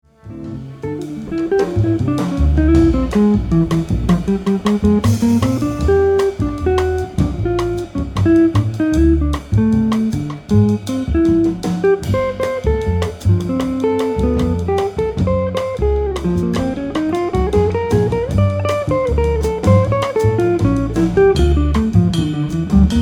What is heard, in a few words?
Bossa